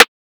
Rimshot; Cymatics; PHARAOH Beta Pack; Business

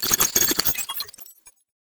Glitch

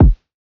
Kick F#; Drum One Shots; Kicks; Cymatics; Eternity Sample Pack

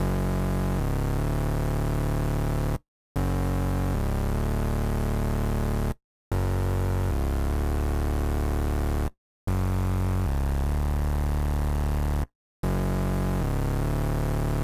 152 BPM F# Min Bass, loop stems; Cymatics; 2022 Melody Collection; Wavy; Off Route

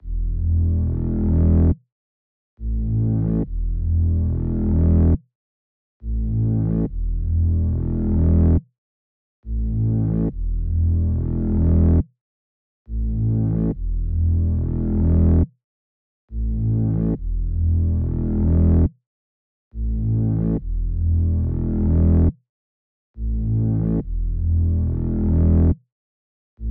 140 BPM E Min Bass; Cymatics; Spitfire; 2022 Melody Collection; Drill

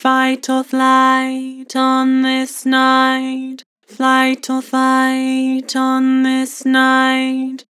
Vocals Flat Fight or flight; Harmor

